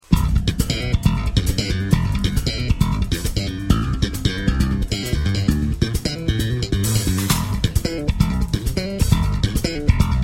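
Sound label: bass; baixo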